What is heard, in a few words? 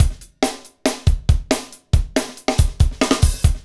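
beats funk, Roland; Northstar - Drumscapes Roland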